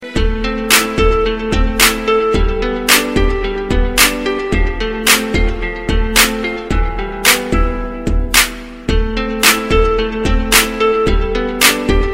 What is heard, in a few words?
Guitar Wid Claps